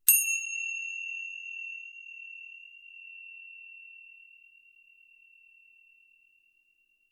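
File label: tibetan cymbal distant strong sound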